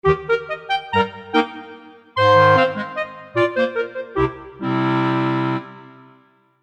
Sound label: Solo Clarinet; PC3